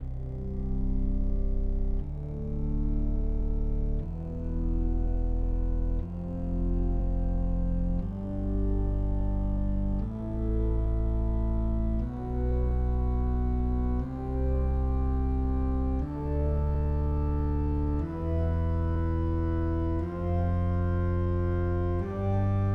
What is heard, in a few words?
Test Organ Tone